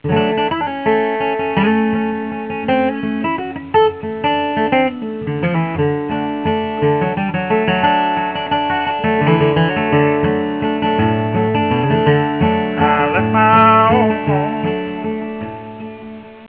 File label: fieldsbr, flatpick